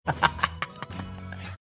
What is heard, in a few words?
cackling